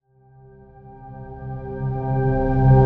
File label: Riser String Rise C; Ghosthack